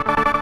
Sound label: synth